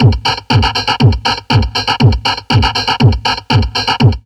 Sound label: Drum Loops Crazy Processed